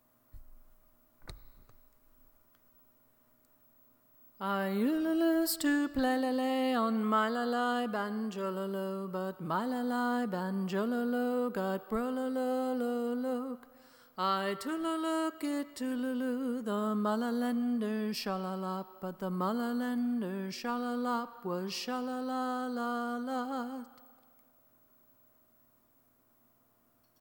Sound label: Banjo Song Solo